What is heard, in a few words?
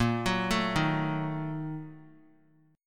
A sharp Major 7th Suspended 4th Sharp 5th; arpeggiated